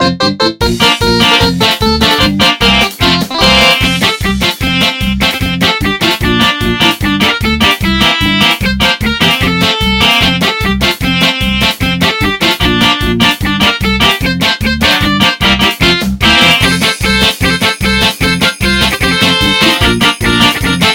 Jingle Bells Hard rock

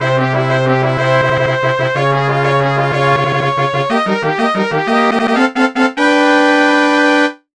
Stage Complete

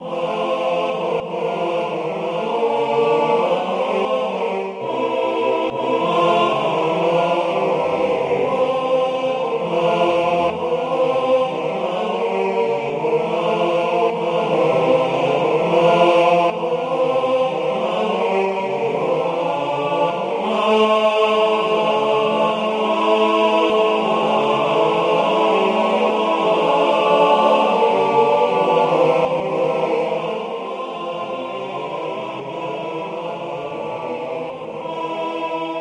Choir Concerto No34 Finale

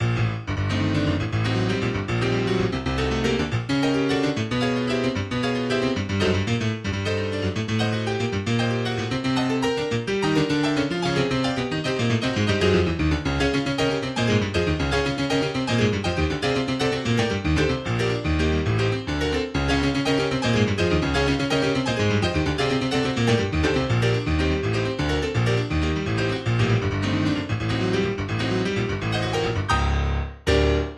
Prelude No22 in G Minor Opus 28 No22

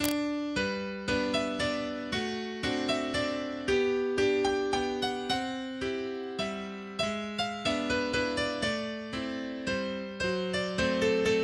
Birthday Waltz

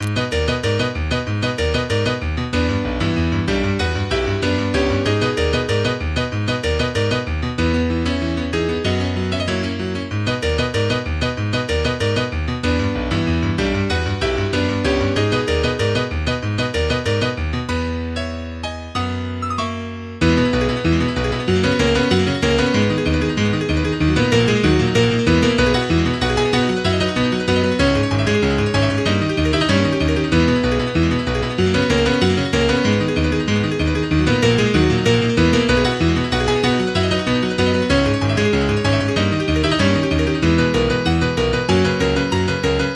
Piano; Deltarune

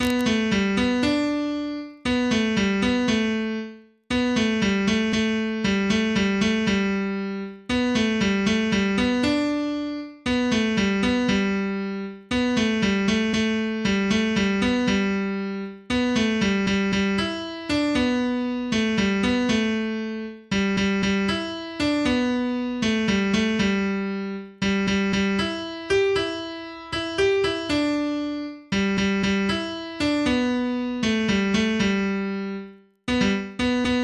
piano

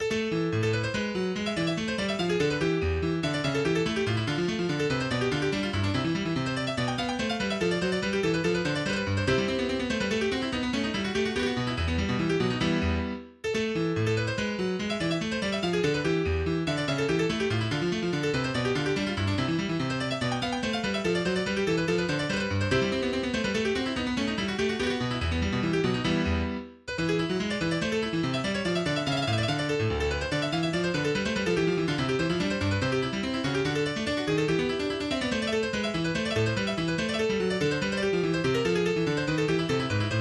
Allemande in A Minor

